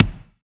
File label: FX Kick